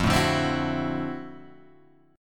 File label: D 9th F down Guitar Standard; strummed; JGuitar